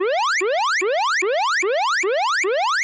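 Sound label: sounds for notifications on phone in 8-bit style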